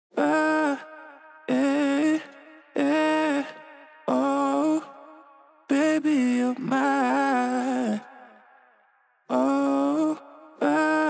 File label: Vocal Phrase - 92 BPM G# Min; Cymatics; Infinity Vocal Collection